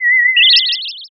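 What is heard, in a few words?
uguisu, Japanese bush warbler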